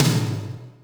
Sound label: Yamaha RM 50 Toms